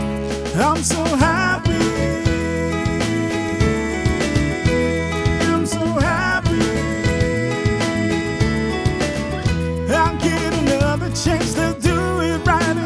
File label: Sad Song sample